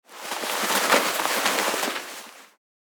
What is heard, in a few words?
Fabric material cloth shuffling